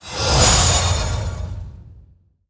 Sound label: Desert Obelisk Attack